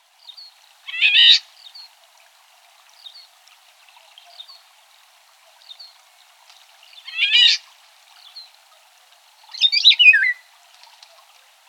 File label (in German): Pirol Oriolus oriolus - Alarm; Krey; Soundquelle: Bergmann, Helb, Baumann, Die Stimmen der Vögel Europas, AULA Verlag 2008